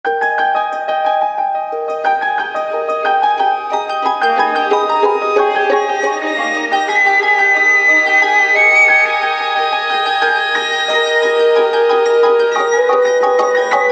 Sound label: Alarm Misterioso; Vertu Constellation Quest